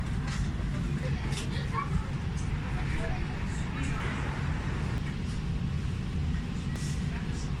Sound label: ambiance, Metro